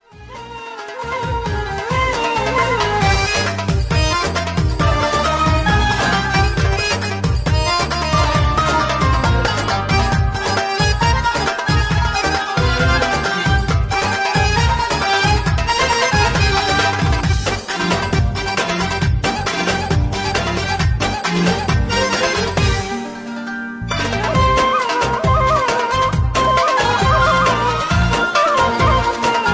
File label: ORIENTAL SOLO